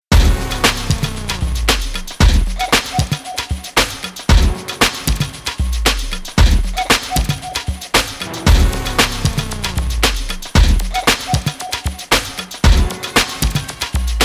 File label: bpm 115